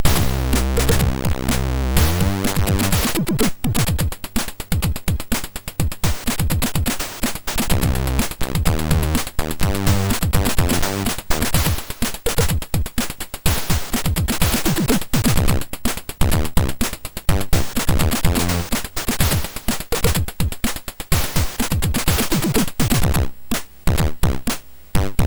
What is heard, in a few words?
Music sid MOS6581R2